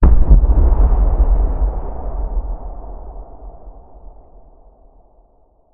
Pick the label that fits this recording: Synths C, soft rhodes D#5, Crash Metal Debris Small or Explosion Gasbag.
Explosion Gasbag